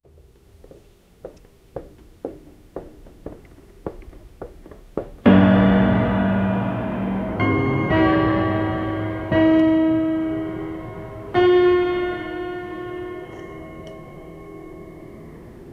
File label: eerie ambient sounds of a